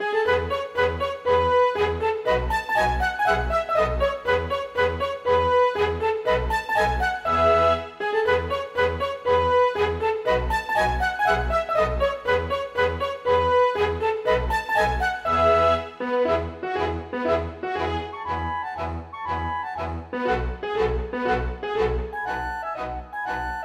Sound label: Gallopade; Ursin; storgårdsmusikk